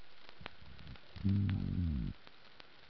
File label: E striatus